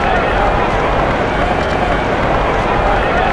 crowd ambient; Totaled; XBOX; crowds

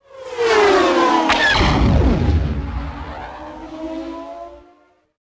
attack; Star Wars Obi Wan; XBOX

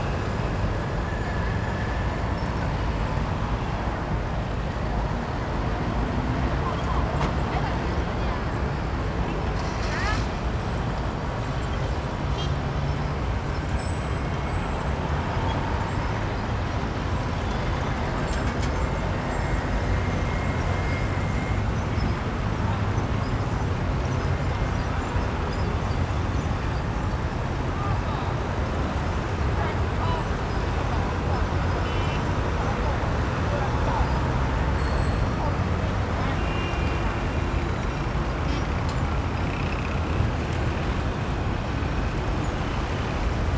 ambience; Street Hoops; XBOX

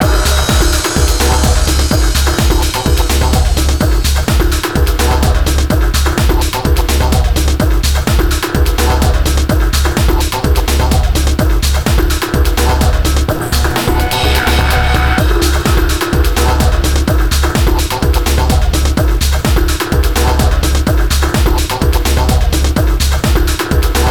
flames music

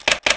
lock; Soldier Of Fortune II Double Helix; XBOX

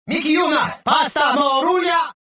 anger

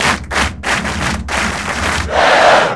chant, Crowd; beckham; XBOX; David Beckham Soccer

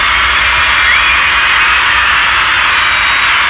back noise; UFCTapout1; XBOX

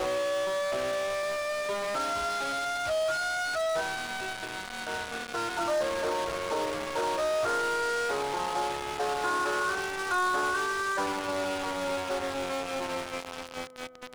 radio music; Soldier Of Fortune II Double Helix; XBOX; ambience

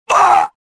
choke; kyle

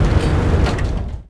helicopter pad rotate end

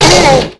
Rap Bark, Dino, Raptor

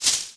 shrub hit

